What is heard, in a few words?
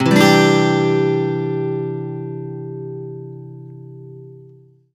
One Shot C add9 downstroke, Acoustic Guitar; Sonic Foundry (Sony Creative Software); Songwriter Acoustic Guitar Companion